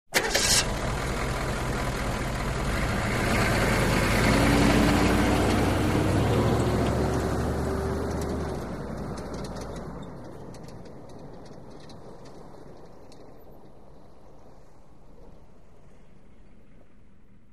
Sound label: Humvee Start Medium